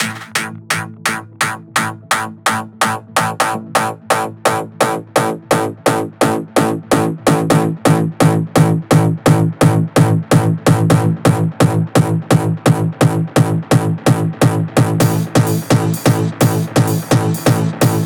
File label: 128BPM 24Bars A, Melody Fills; Time Machine; Vengeance Samples